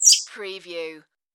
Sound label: Squeak